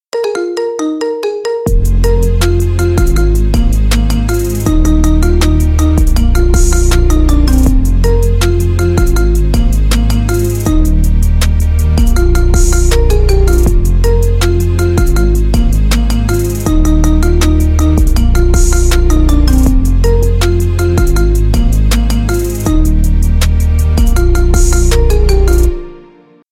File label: marimba remix; iphone